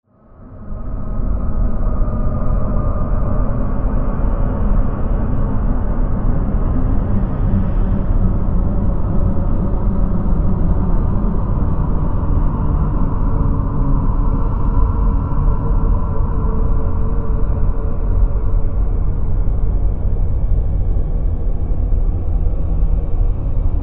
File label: ambient mind control